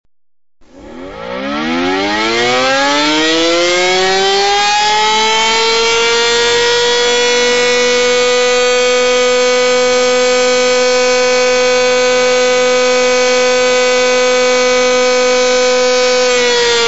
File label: sirens